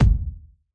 kick

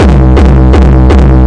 psycho kicks, drum loops and breaks, 164 bpm

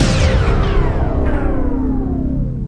Electro FX; Ueb HE Electro FX

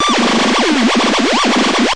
analog alarm goes crazy; Electro and Synthetic

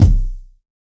drop kick; Hip Hop Specialty Kit; STYLE KITS (Hip Hop, Etc)

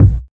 KICK, Volca; WallsVado Volca Sample Pack

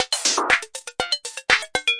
120, DRUM LOOPS and BREAKS; 111 to 120 bpm

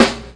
flat sd, snare; single hits

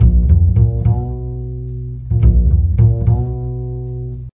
mysterious acoustic bassline

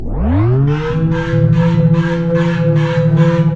wind up clanger

